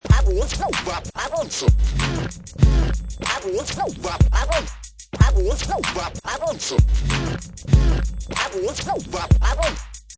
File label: Scratch Crazy 95 beat, Professional killer vinyl scratch on top of hip hop beat; Vinyl Crazy Scratch 95 bpm; timed to 95; Dj